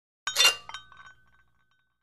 Free Weights Exerci

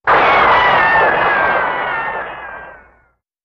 Ricochet, bullet bys & riccos

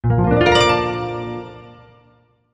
Music Effect Synthe, guitar

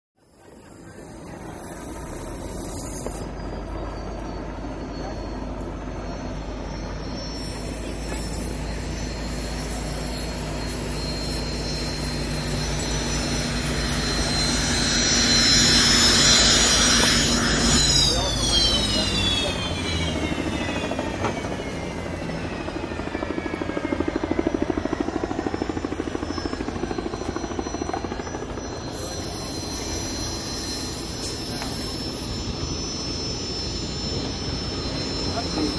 Hydroplane Dock Amb

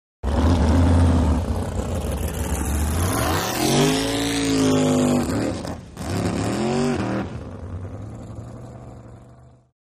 Drag Boat Idle revs C

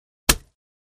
Axe Paneling 2 Side Du, axe hits

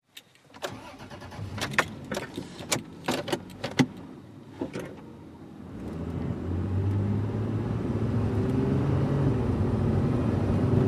SUV Engine Accele

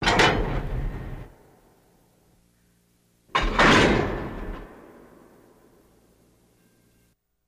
Train Coupling Rever; couplers

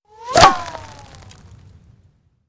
Bullet Impacts Whir, stone; Bullet Impacts With Whir In, Impact And Debris

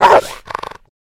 Rottweiler Vicious

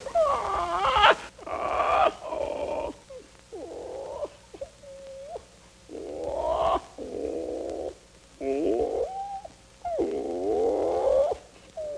Parrot Squawking

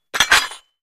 Blade Chings Fast Met; swords

